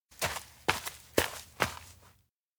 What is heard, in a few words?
steps outdoor lake check; aino; anemoi